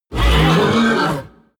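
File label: coloss roar deep short, creatures; kreaturen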